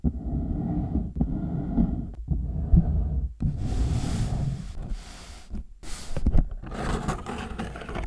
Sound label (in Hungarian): hangszin 7 fokusag; hangszinszolfezs